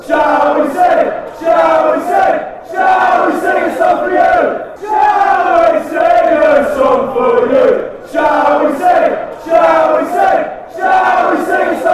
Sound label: shall we sing a song for you, chants